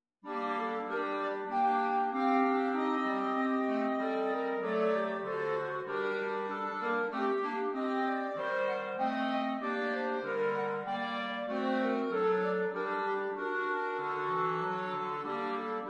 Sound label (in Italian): quartetti